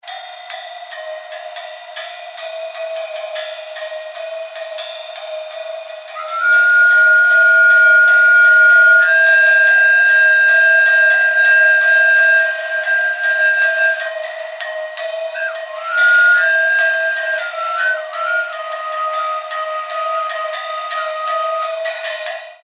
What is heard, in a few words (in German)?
obertonfloete upshift